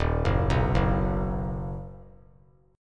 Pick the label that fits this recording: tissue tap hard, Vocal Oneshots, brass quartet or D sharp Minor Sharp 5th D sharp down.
D sharp Minor Sharp 5th D sharp down